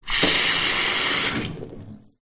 household tap small faucet bathroom on off quickly